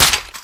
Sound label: impact weapon large asphalt; collide; large-weapon